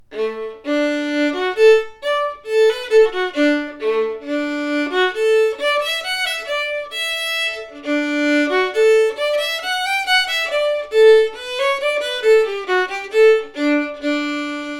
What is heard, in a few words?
solo violin